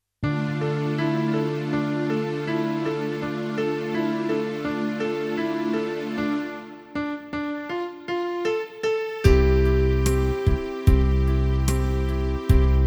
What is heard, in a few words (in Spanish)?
organo